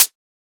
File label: Closed Hat